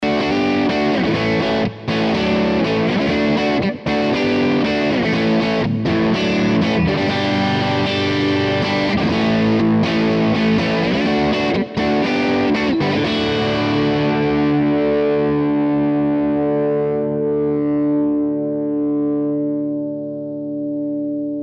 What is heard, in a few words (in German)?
Les Paul Modern Beide Pickups Crunch und Sustain